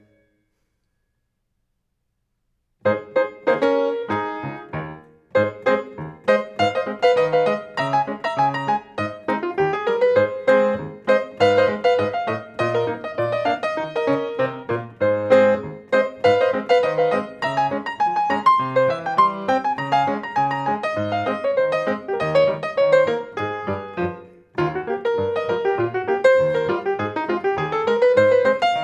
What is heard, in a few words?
rag 100bpm; Easy Winners